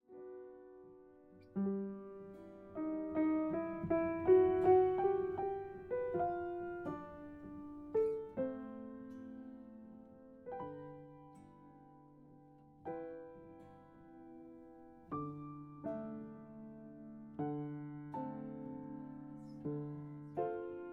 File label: Apf Dry ECM-100U; microphone; hi-res